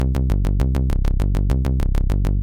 16tel Rave Bass

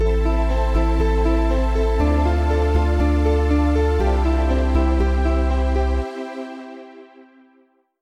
Akkordbeispiel Bass Klavier

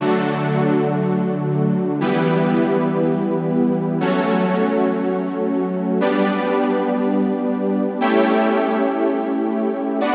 D-Dur 1 6

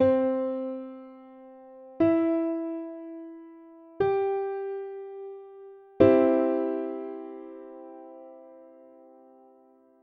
terzenschichtung c dur

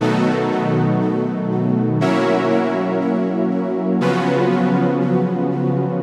A Dur Nebenakkorde

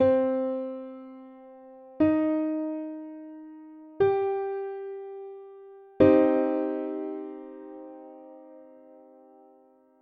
terzenschichtung c moll

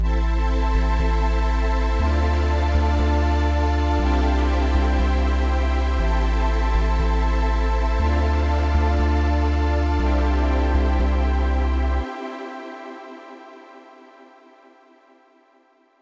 Akkordbeispiel mit Bass

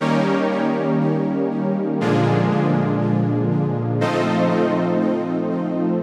Des-Dur Nebenakkorde

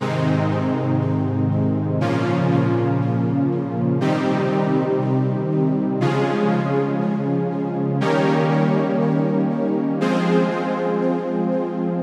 as dur

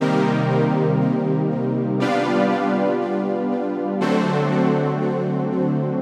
Bb dur nebenakkorde

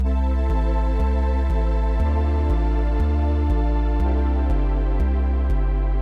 Akkordbeispiel mit Bass Quintenabstand

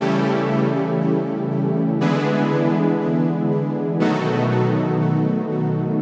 G Dur Nebenakkorde

